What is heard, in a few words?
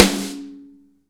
SN ambient; Real Mega Drums